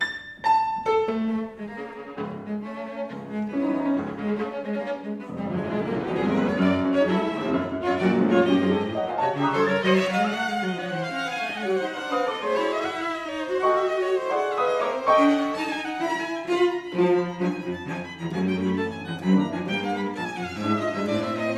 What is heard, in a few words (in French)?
Quatuor avec piano